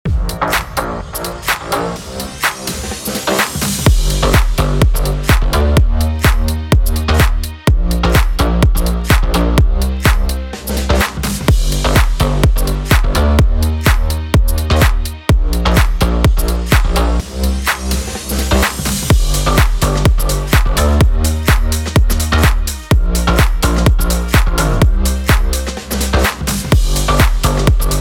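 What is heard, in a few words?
FUTURE HOUSE G minor 126BPM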